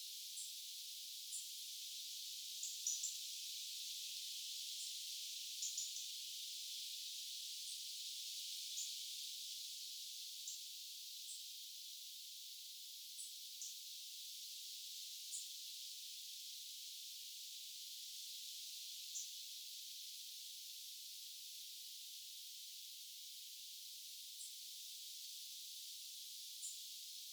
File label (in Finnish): ilm tuollaisia laulurastaslinnun aania